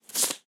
wood tile pickup

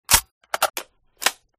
reload, guns; blr

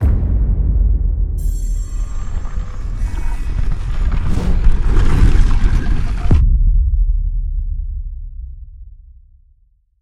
intro Screen Closed; asgardian stones

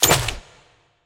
space tile drop

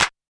clap, electronic

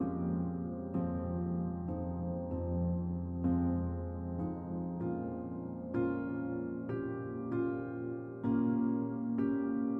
MELODICS, viral hiphop

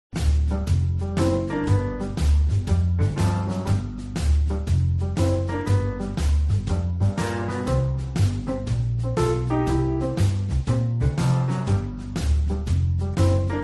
bg music, pool; 8ball-pool

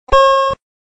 circle ding; fruits

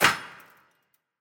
Shrapnel Hit

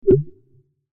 chat send

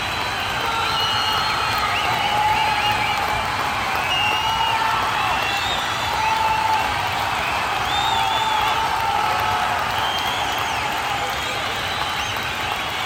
crowd; penalty kick online

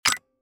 button Total Bet Down; slots; ui

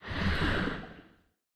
underwater breathe air

